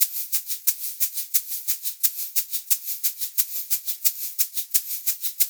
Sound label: LOOP, SHAKERS, Percussion